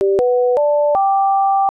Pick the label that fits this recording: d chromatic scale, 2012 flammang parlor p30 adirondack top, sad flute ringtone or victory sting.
victory sting